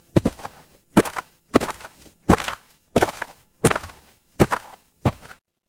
footsteps gravel

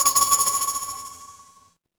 instrument singing bowl

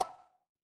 ui pop

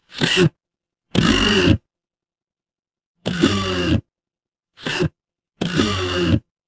mechanical lever

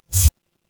ui power off

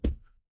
impact thud

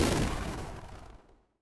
retro game explosion

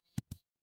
ui power off

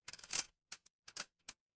door lock

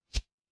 ui swipe

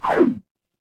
retro game laser

